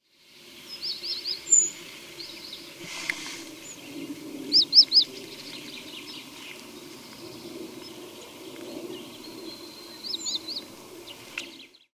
kohlmeise

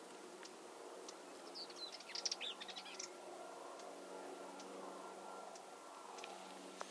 weissbart-grasmuecke

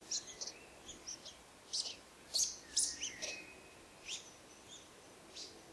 waldschnepfe

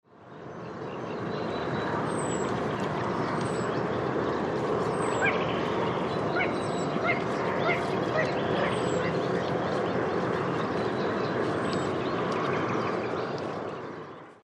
kleines sumpfhuhn

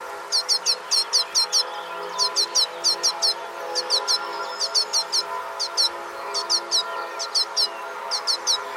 alpenbirkenzeisig

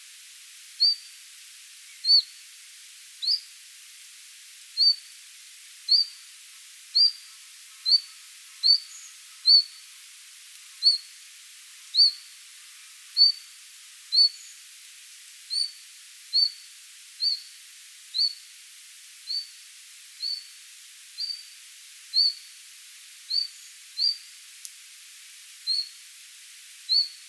taigazilpzalp

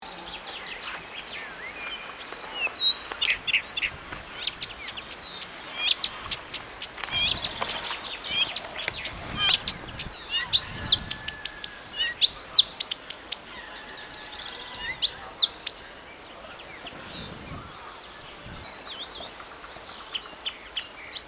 buschrohrsaenger

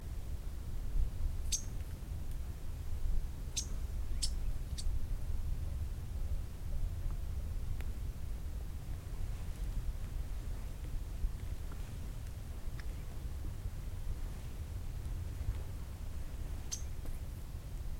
seidensaenger

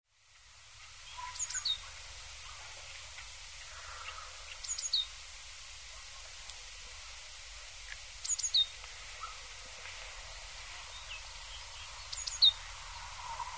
fuerteventura-blaumeise

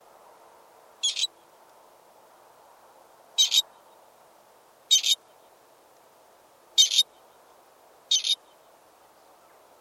rebhuhn

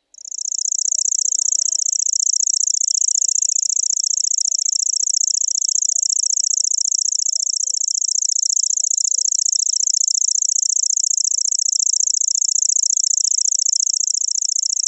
strichelschwirl

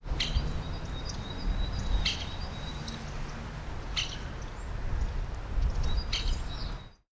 blutspecht

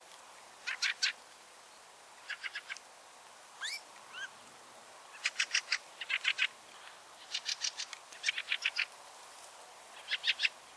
wacholderdrossel

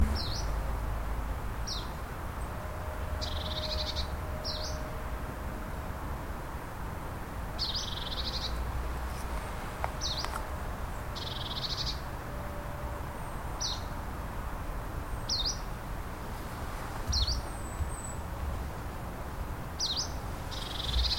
gelbbrauen-laubsaenger

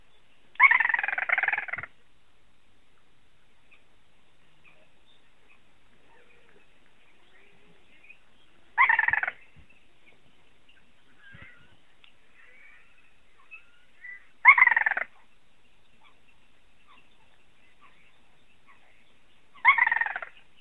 kleines sumpfhuhn

